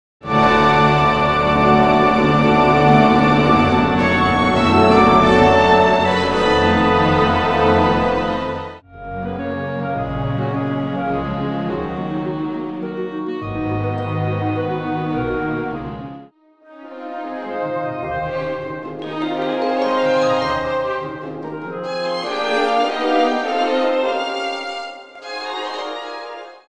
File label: Flower duet Madama Butterfly Full Orch accomp; MB Full Orchestra Accompaniments